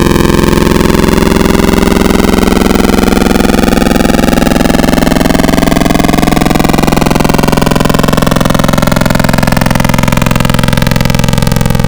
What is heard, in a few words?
PWM Weird; Digital; Synthetic's Serum Collection